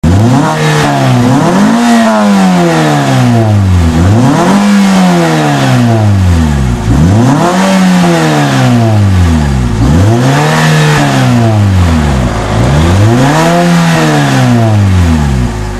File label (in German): auspuffsound serie, mini cooper cabrio